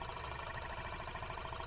reel spin; spinsane

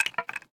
symbol Stop On Stone; magic maid cafe

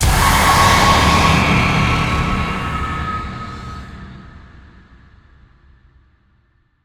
super Mega Win Text; halloweenjack

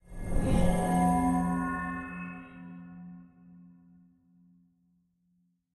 large Win; goldengrimoire

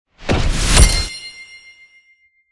main Game Scatter Won; lost relics

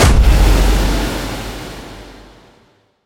big Win Start; oceans treasure

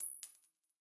count up; goldengrimoire

